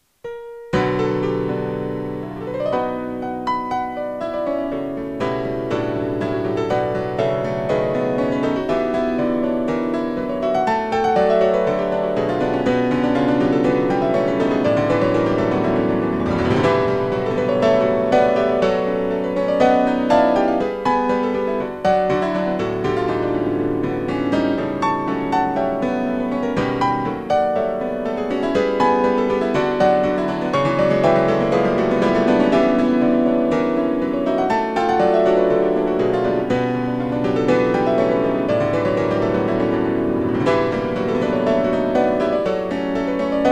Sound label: piano